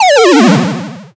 powerdown

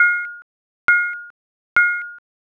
radar

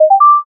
success

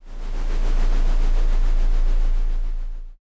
earthquake

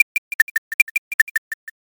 ticktock

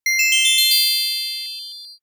windchime

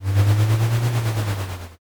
growl

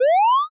nobiru

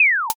sheath

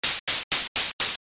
saw

